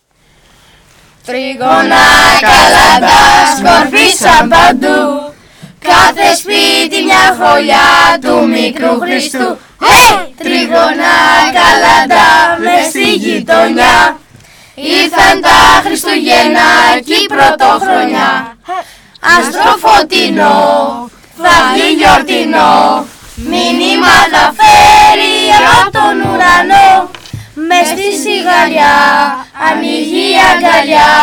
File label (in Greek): κάλαντα; 3ο γυμνάσιο Τρικάλων